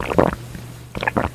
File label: human drink; theodyssey